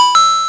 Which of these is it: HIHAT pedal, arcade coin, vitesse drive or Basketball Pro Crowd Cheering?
arcade coin